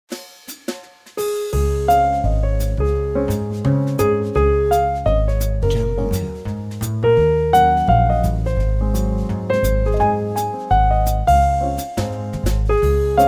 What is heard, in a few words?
Christmas Swing Jingle Bells